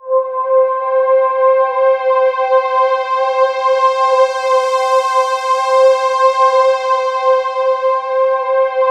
C5, Synth Leads

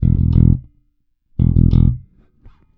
Stacatto Sixteenth Pattern, Bass; Construction Kit; Riffs, Patterns Licks

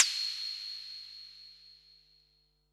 HH, Pearl SC-40 Hihats and cymbals

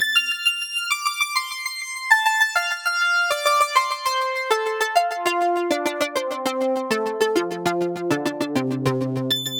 Cascading Mel; Zero-G; Future Wave

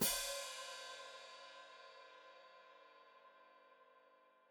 Hat Closed Open, Hi-Hat

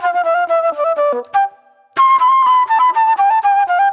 Movie Flute

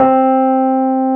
AMB ROAD, Keyboards Old School; USB Soundscan vol.09; AKAI

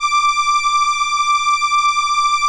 ELKA, String Machines; ELKA RHAP

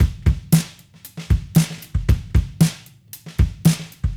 Cruisin' Disco Drums, 70s Analog Funk

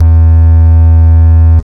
TRAXBASS, Hard & Loud Techno; USB Soundscan vol.01; AKAI

